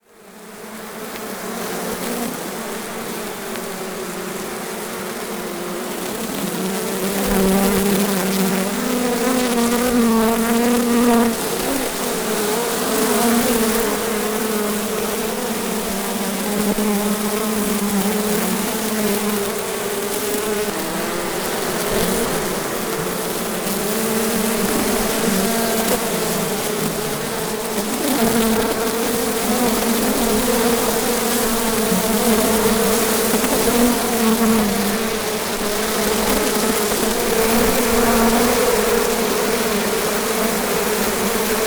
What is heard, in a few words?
bees recorded 12000 bees multi channel